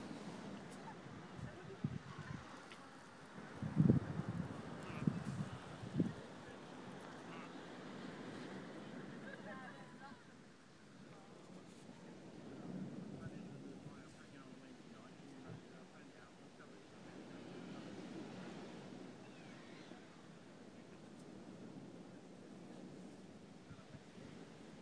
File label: british seaside